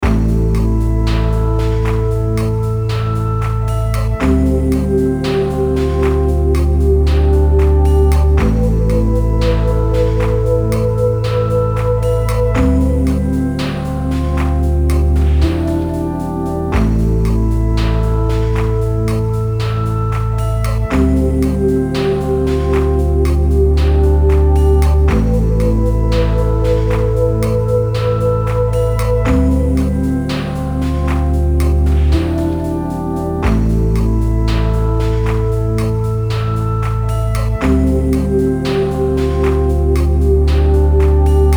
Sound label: GM loops